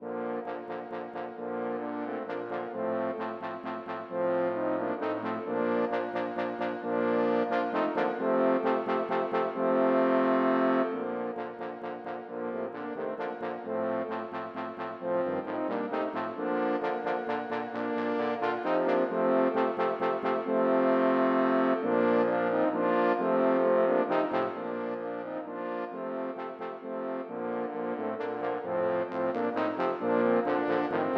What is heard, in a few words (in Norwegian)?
For fire tromboner baritone